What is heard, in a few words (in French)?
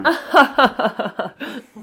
rire